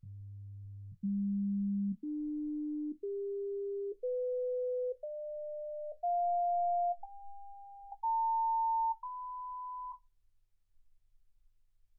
sinus tones 48kHz

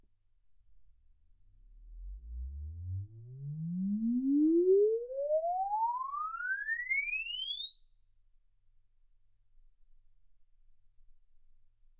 exp swept sinus 10Hz 24kHz

